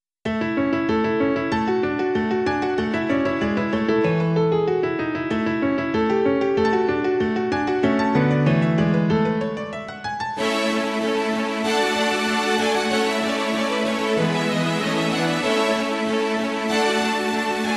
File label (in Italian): rondo allegreto grazioso